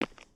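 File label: Foot Concrete Sneaker Walk, footsteps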